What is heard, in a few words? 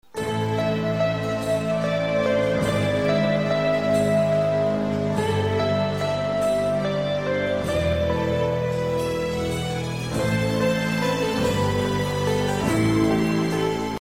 Nice Piano